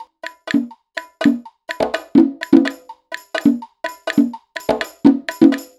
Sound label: Percussion, cinematic funk; Sonic Foundry (Sony Creative Software); Cinematic Funk Urban Tone Poems